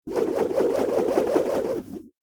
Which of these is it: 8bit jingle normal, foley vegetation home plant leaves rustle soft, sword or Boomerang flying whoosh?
Boomerang flying whoosh